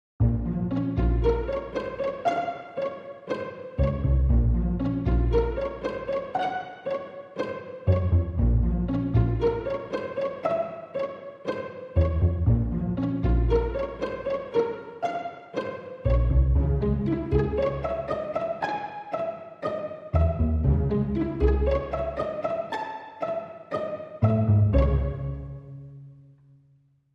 Strings Only

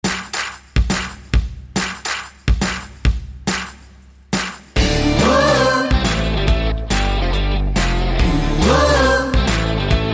Celebration Chant

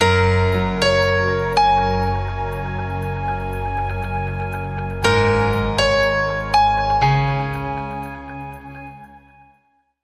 Sonic 3 Note Logo

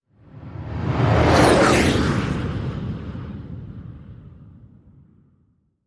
fly by jets overhead

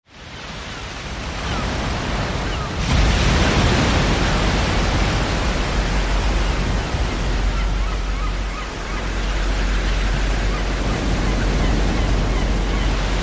Beach Day Ocean Waves Atmos

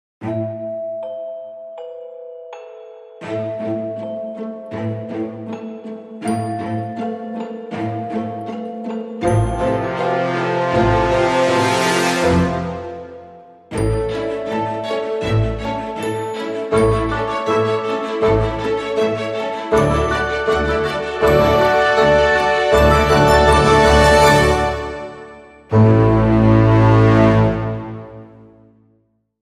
No Perc

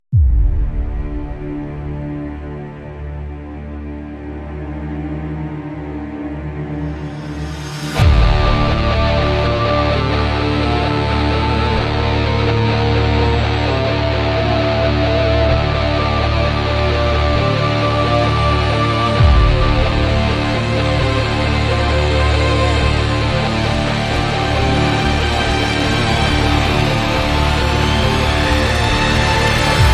building climax, underscore

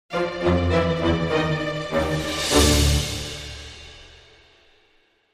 Dramedy Sting, Short

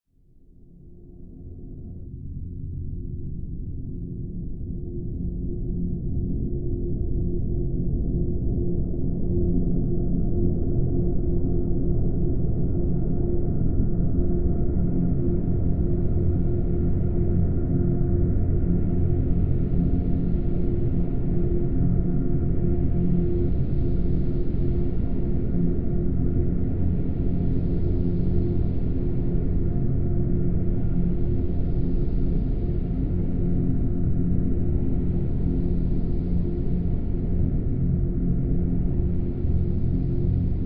Drn Delusion; Drn Delusion F

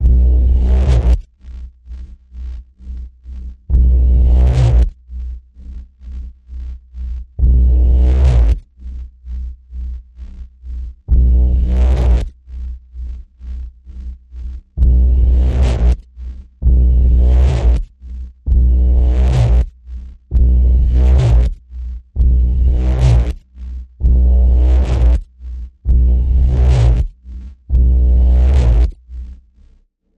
Ominous Pulse B, Key of B

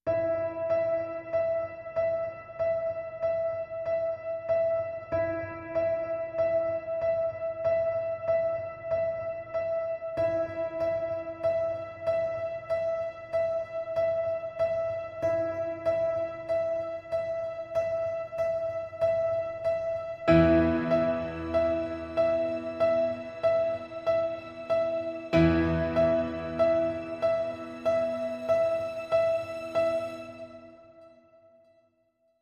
Persistent Piano E

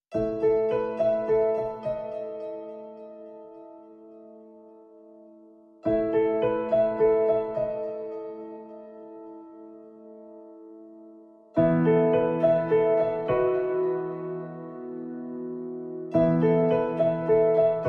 Pads and Piano

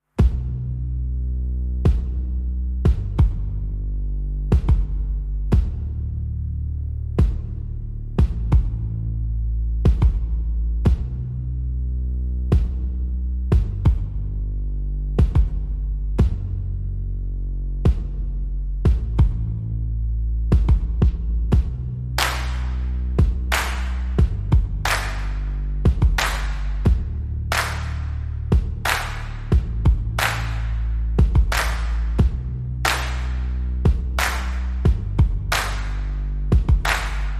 Drums and Bass Mix

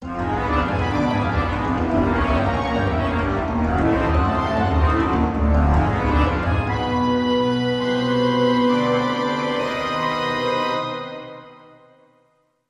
Pipe Organ Horror Accent

